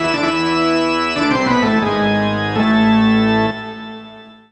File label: CHORGAN, creepy sounds; Church Organ